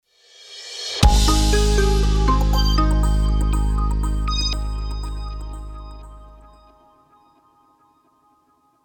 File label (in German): Soundlogo; ISTOS; corporate sounds produktion